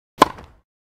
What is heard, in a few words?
Overhand Serve, Tennis Balls; JC Audio; Tennis Balls And Ambience